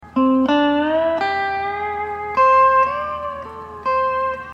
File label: hawaiian piece